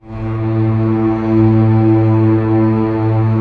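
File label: strings A1 PP; Sound On Sound - Peter Siedleczek's Orchestra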